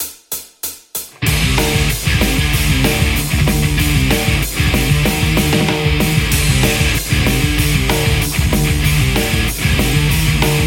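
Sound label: Stratocaster; metal; kuassa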